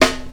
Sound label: clean snare drum sound c key